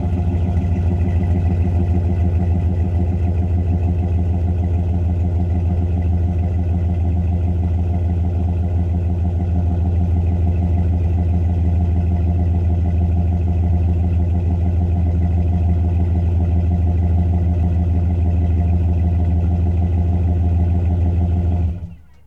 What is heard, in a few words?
Truck Dodge Ram Idle Off; Trucks